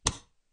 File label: shoot; Chelimbalo